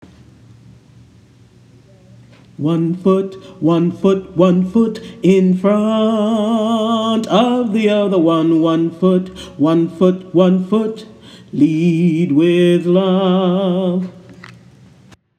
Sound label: One Foot Chorus low part; OaxacaCanta